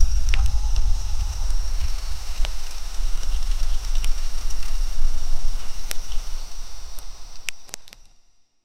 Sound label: a broken electronic glitc